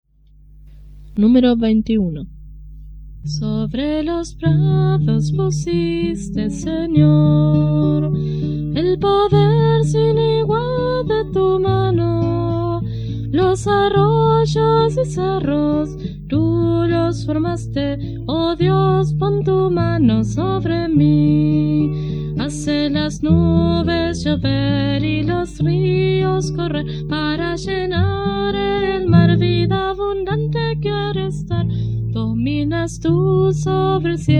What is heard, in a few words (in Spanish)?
Coro, himnario niños